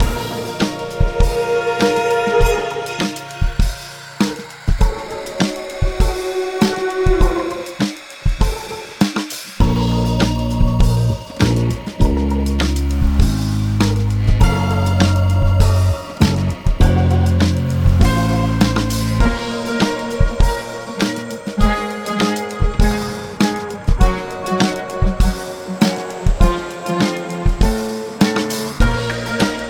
Fast Beat seamless loop; Ghost Hunting

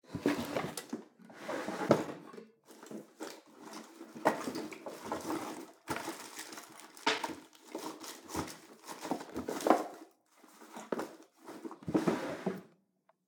search desk; Film Noir; SoundPad

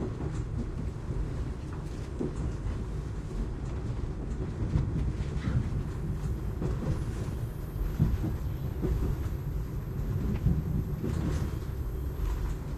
train interior, backgrounds; Secret Agent

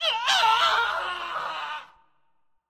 scream man; Film Noir; SoundPad

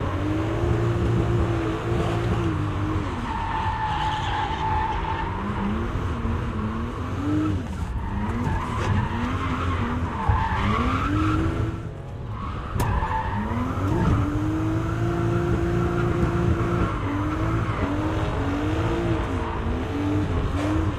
car chase interior, backgrounds; Secret Agent

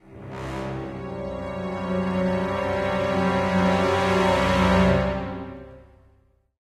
stinger; Secret Agent

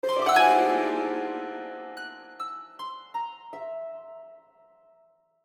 sting harp; Film Noir; SoundPad

